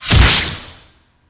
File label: sword